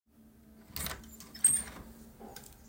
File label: Open Door Sound Sound Effects; Creaking Door